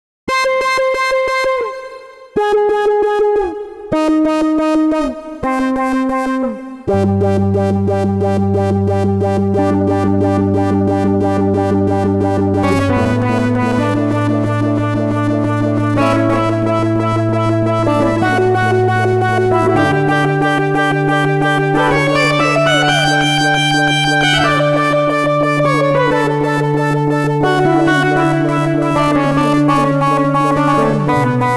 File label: hybrid sync seq; the digital life